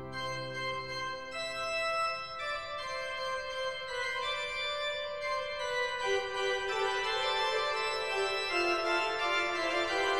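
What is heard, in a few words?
Fugue, organ; J. S. Bach; Piet Kee Plays Bach, Volume 3; Eight (short) Preludes and Fuges; No. 1 in C major, BWV 553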